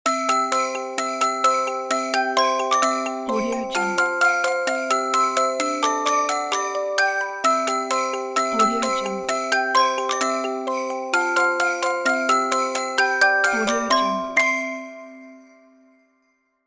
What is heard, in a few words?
jingle bells musical toy piano; applehillstudios